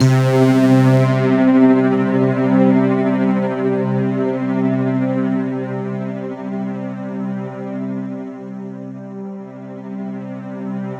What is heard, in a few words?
C2, Roland JV 2080 CLUB ORGAN